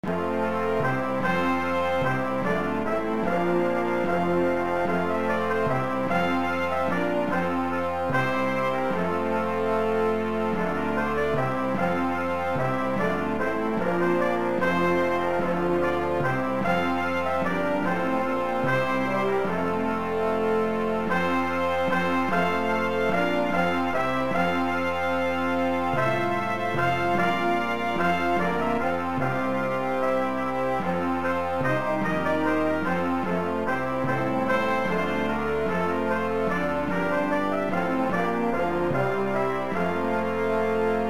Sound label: Hymn Brass